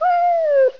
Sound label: slv pain, dog; svencoop; cityofthe dead